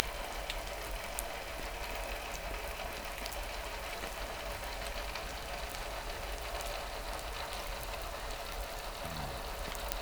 hydrophones, lime kiln

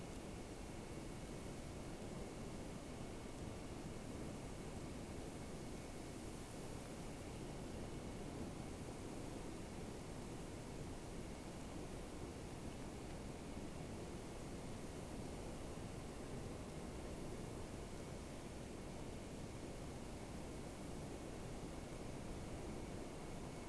sound, Boat; SeaSnake

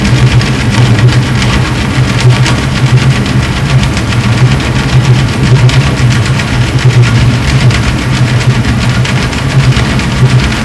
Hydrophones, orcasound

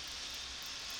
Background Noise

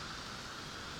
Background Noise

